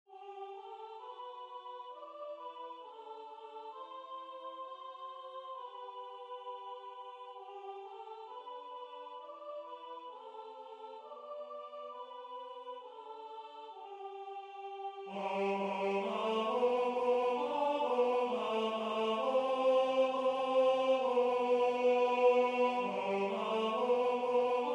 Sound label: Tenors; La belle se siet